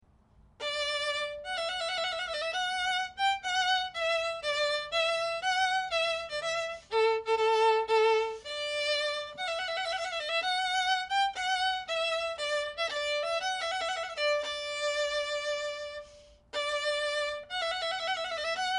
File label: trumpet voluntary faster; clarke